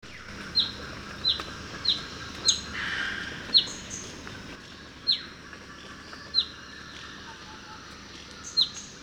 Whistlers Warbler Phylloscopus whistleri nemoralis; Ladakh